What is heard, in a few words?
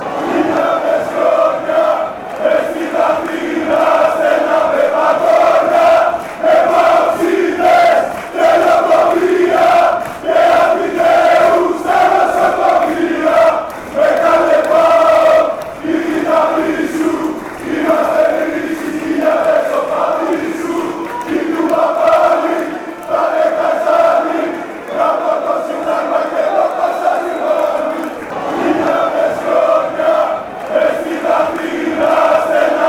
paok fc chant